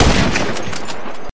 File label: sniper; weapon; gun